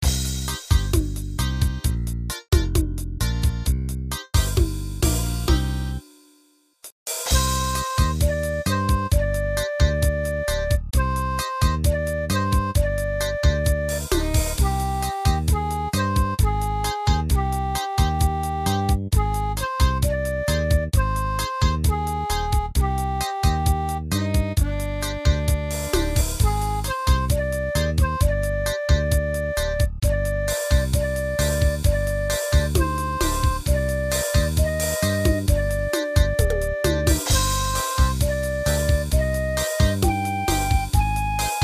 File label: samba